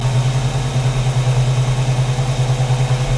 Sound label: Mustang 68 Fastback Engine Idle Loop - Engine Perspective